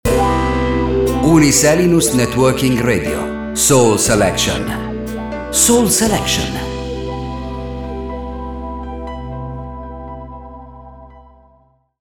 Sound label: Soul Selection; Uniselinus